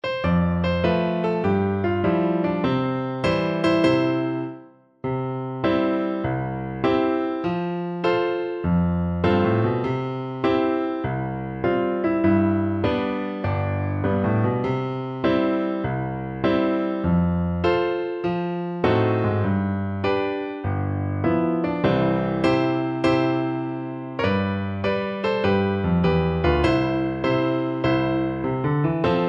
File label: French Horn